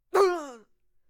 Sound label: male death